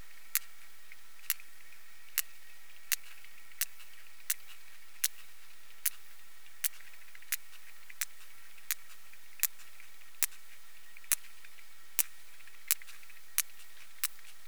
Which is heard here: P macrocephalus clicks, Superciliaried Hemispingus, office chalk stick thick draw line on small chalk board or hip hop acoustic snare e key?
P macrocephalus clicks